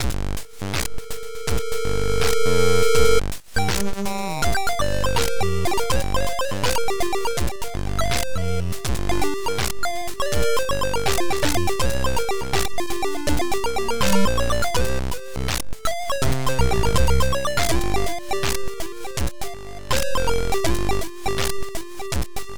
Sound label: Jazz